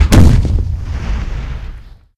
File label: explosion